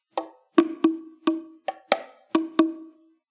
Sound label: sms drum; Mudita Pure